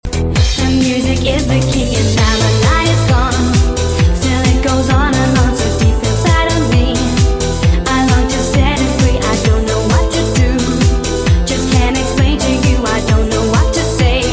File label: trance, ringtone